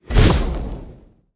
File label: imp blink